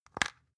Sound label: spice jar open